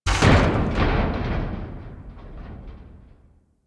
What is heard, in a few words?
Ship Hull Impact Small; Hit Effects; Warframe; Railjack Hull Hit Effects